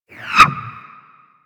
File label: Bullet By; Grineer Arch Skiff